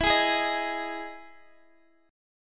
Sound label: E Major 7th E, Guitar, Open D; chord shape